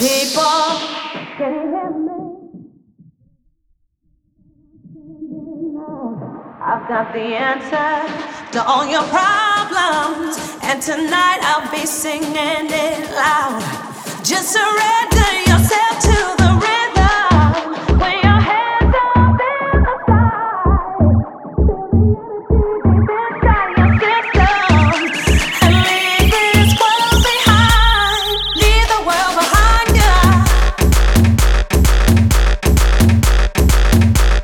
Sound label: Bootleg 130 Bpm